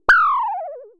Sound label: Synare Filter; Star Instruments Synare 3